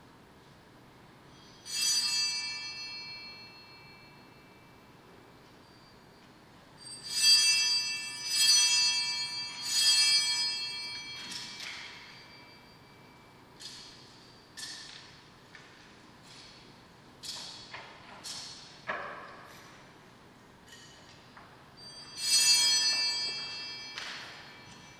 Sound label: bells